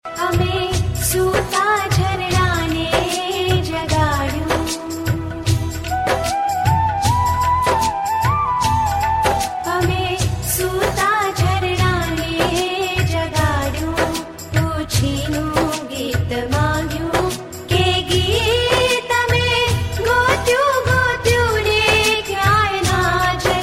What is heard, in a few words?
Gujarati Ringtone